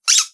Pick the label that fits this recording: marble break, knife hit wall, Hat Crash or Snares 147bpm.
knife hit wall